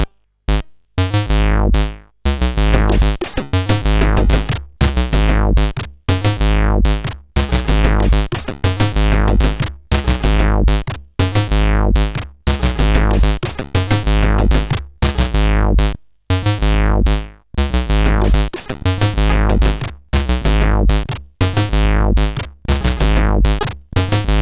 CSG 8580R5

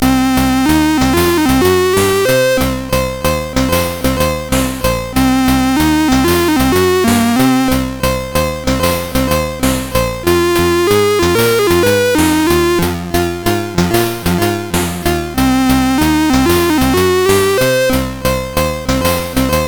CSG8580R5, GAMES; Street Beat

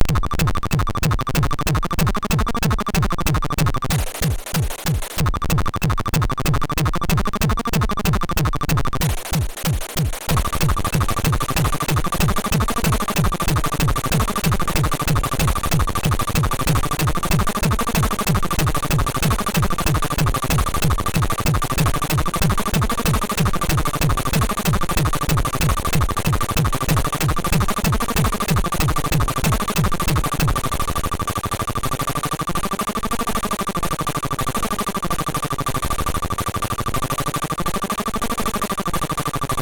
Tune, MOS6581R3; Grasbrenner

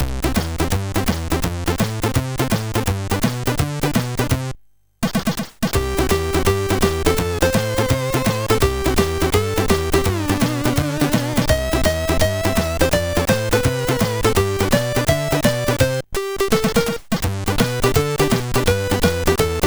Guitar, MOS6581R3

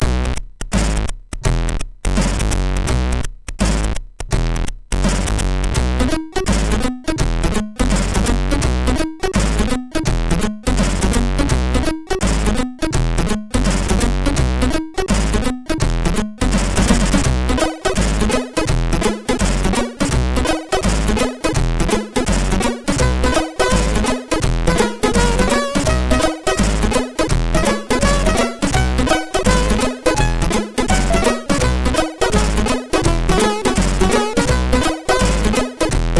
MOS6581; sid MOS6581R3; Hamburger Royal

